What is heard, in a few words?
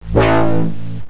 shield; opensurge